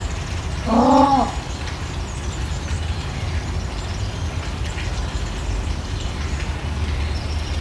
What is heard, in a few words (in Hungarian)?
kerek, pápaszemes pingvin; debreceni zoo 2012